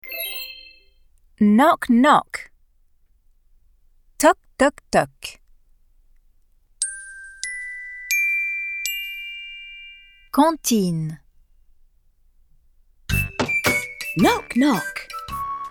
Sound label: knock knock; Paddington Valisette page 8